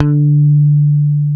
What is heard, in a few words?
PICK D 4, Bass, ROCK PICK; Spectrasonics; Bass Legends